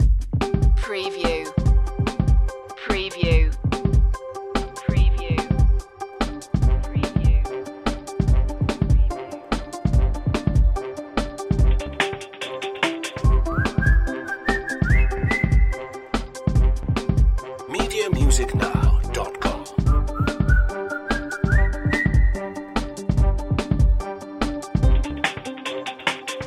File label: playful march loop